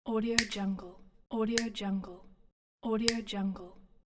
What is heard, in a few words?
wire cutters